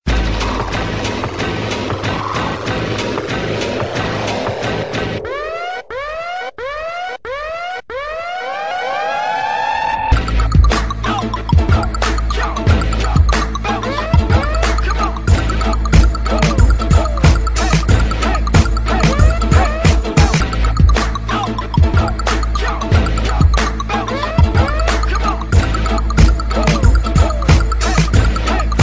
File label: Beat